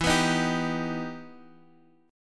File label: E Minor Major 7th, Guitar, Half Step Down